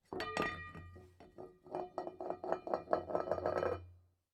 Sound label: bowl spin